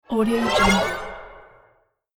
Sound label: futuristic swipe